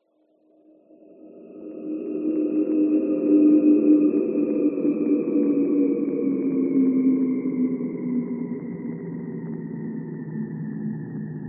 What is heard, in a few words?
science fiction spaceship whine pass by no pan